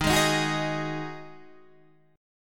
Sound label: D sharp Minor Double Flat 5th, Guitar, DADGAD